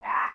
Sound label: Pets Skunk Idle Short; creatures; Lego